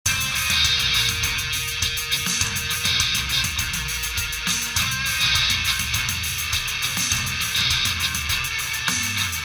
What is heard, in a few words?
video action loop